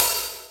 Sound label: OPEN HOLD HAT; E-MU Formula 4000 Series Vol. 5; Protozoa; Phatt Presets